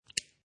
Highlighter Pen Close, Place cap on pen, plastic pen; Pens; Offices